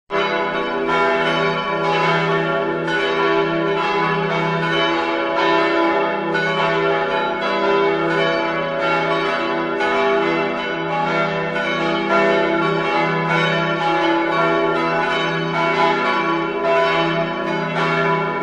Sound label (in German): glockengelaeut